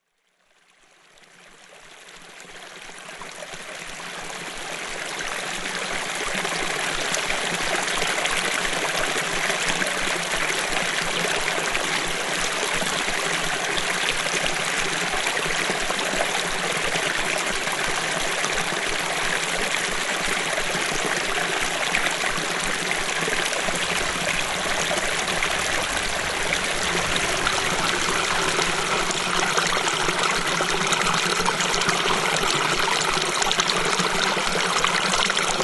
Caldeirao Corvo Acores

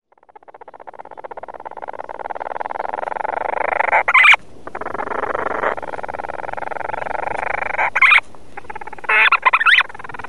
Painho da Madeira Selvagens

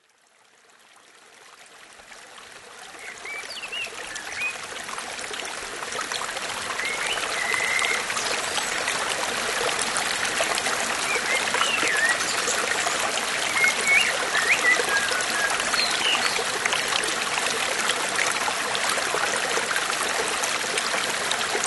Floresta Laurissilva Madeira

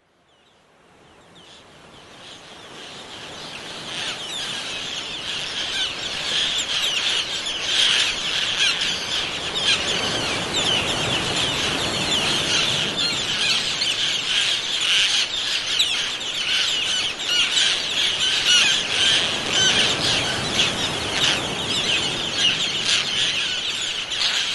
Garajau rosado, Acores; SMaria

